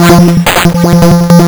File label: lsdj waves; wavetables